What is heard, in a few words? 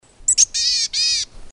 Black Capped Chickadee